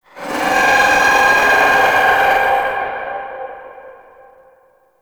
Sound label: Raptor Spawn Scream; Warframe